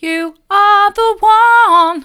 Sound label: mid, vocal